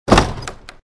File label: porta; summer